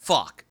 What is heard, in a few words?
hurt; rlcraft-1.12.2; playerbosses